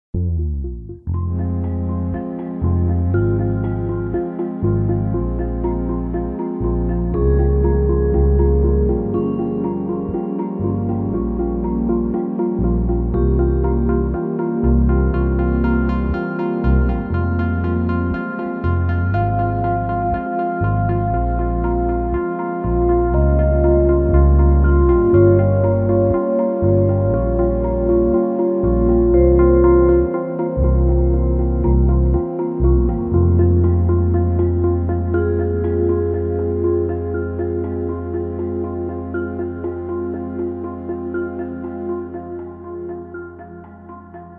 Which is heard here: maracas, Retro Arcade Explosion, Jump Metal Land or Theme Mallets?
Theme Mallets